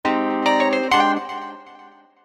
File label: Not A Wurlie, V-Synth